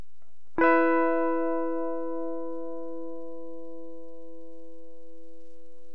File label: high chord